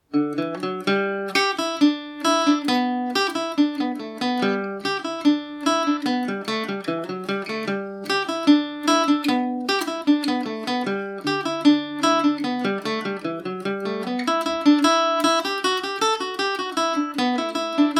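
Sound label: pigtown fliing revisited G major, mandolin; The Pigtown Fling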